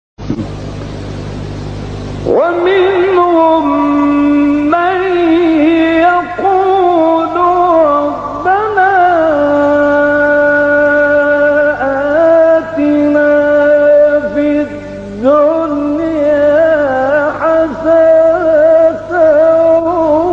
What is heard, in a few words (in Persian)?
تلاوت آیه سوره بقره; 201; استاد غلوش